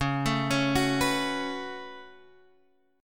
C sharp 7th Suspended 4th Sharp 5th Guitar Standard; arpeggiated; 9,12,11,11,12,x; capo=2